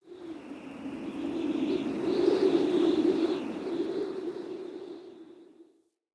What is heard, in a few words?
Skylight Wind Howl Gust; Ambience; Under City